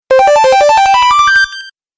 Game Positive Win Award, video game

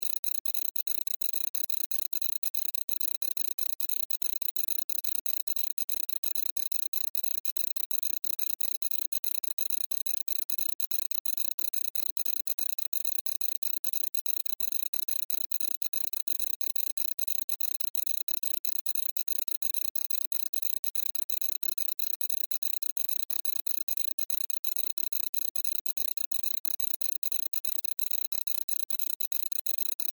Science Fiction Computer Process Beeps; Computer Sound Effects; Computers (Sound Effects:Science Fiction); SFX Bible 6

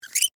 Metal Hinge Squeak, Foley, Metal, Hinge, Squeak; Latches; Objects

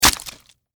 Knife Stab Flesh Blood Impact; Warfare; Knives; Combat

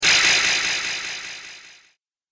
Laser Web Spray; Laser Sound Sound Effects

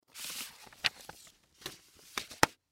Folder Gather Papers Set Down; Office; Blastwave

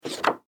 Poster Board Set Down; Paper; Offices

